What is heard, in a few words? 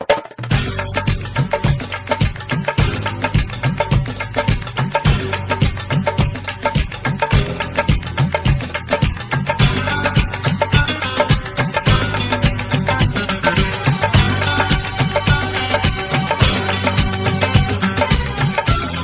Desi Beats Remix